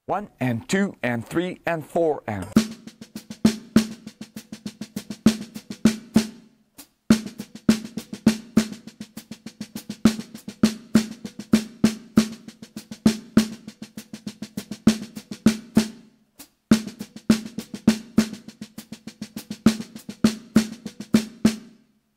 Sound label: Melody 16th Notes